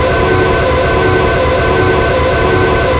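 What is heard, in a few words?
elevator move; hl2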